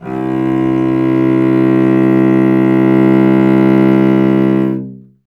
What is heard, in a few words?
STR cello C, Cello; Miroslav Vitous; Solo Instruments VOL-1